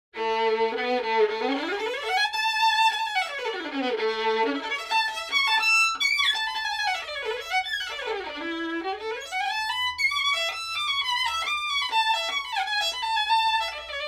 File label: violin solo